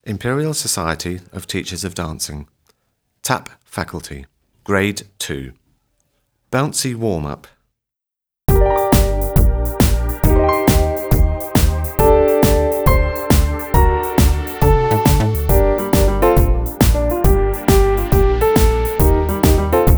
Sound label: Bouncy Warm Up